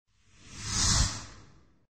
veter s peskom; Звук ветра; Звук ветра для перехода в видео или для монтажного перехода в аудио монтаже

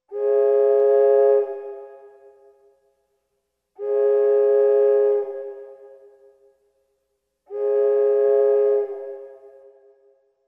gudok korablia

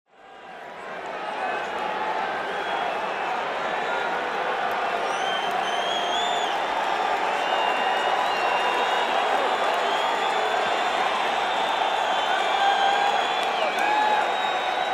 nedovolnaja tolpa; Звуки футбола; Звуки игры в футбол

